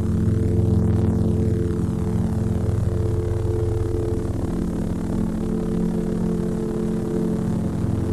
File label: saber loop; lightsaber